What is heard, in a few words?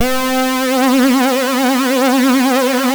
Synth and Organ